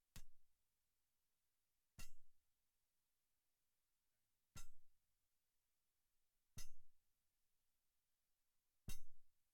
Metal Grate Floor Hit Pickaxe Hammer Thingy Hard Great Hall